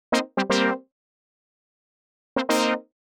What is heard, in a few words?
120 Pad riff C, Keys, Nu Jazz House; PowerFX